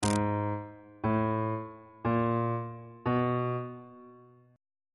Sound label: g sharp 2nd intervals